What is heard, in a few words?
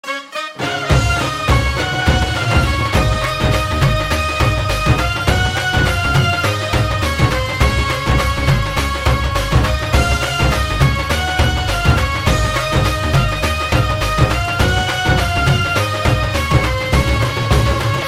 Drum BGM